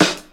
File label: 00s noisy steel snare drum d sharp key